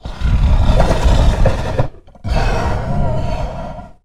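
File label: Stealth Breath Movement; Evolve; Goliath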